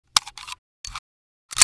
silencer reload, weapons foley; Spearhead Demo; MacFormat 2003 June

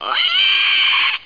screech; attic

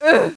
OUCH; Renaissance

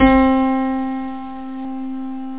piano high; OctaMED SoundStudio V1; instruments

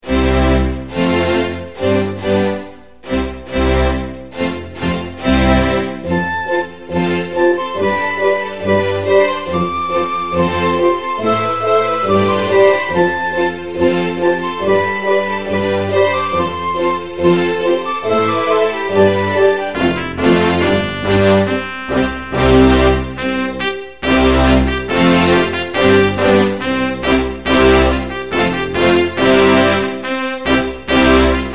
anthem

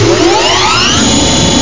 Fire-up

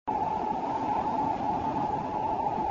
Wind Fast; moonstone madness

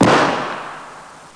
bang

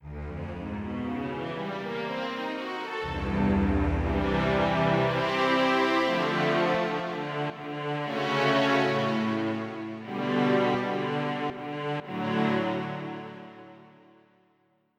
Slower Chorus, string2s; SoundFont - Traditional, Voice & Keys - Volume 2

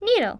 Needle

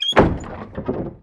barn door; The Sum of All Fears

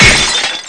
crystal break; Ferazel's Wand Demo

